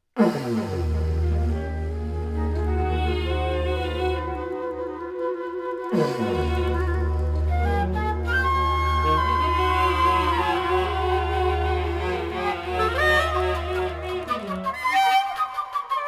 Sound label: tube screaming; weeklybeats 2026